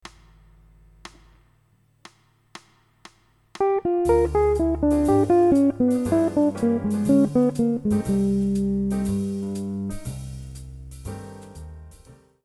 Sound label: minor ii v i lick, guitar